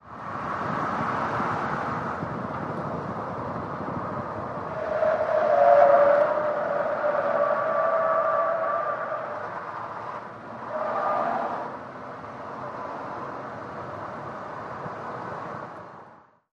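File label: SUV Truck Accel Corners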